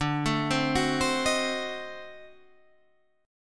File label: D 7th Flat 9th, down, D7b9 chord; arpeggiated; JGuitar